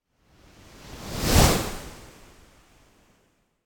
Wave By Medium, Fast And Thin Wave - Passing L to R Wave Whoosh; Hollywood Edge; sound designer's toolkit v2